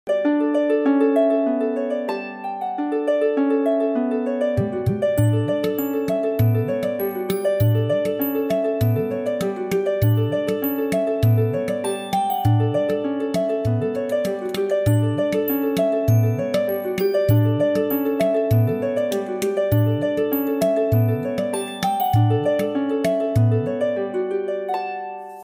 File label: cool beautiful new ringtone